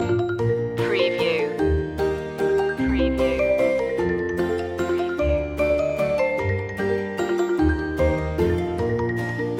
we wish you a merry christmas orch loop; media music now

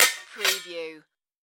Sword Impact Shield

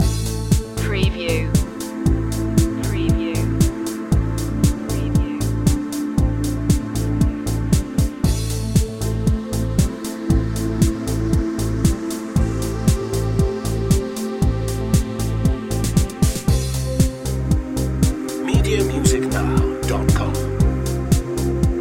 technology beat loop

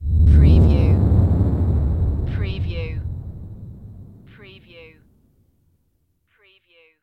Evil Pass By

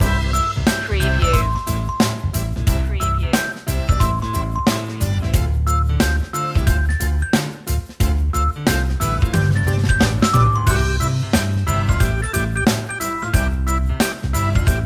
walk happy loop

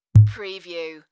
Bass Button

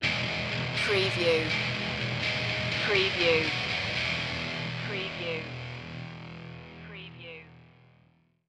flying solo stinger

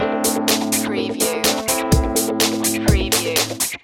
disco funk loop

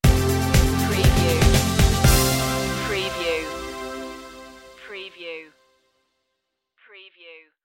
reflections stinger

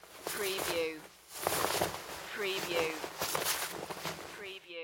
Cloth Coat Put On

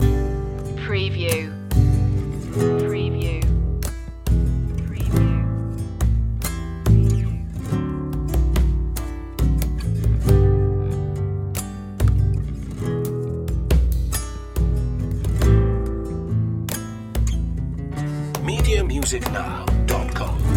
latino loop

